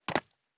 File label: Land; SandBox Musics